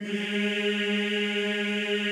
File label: EEH G#2, Extended Classical Choir; Best Service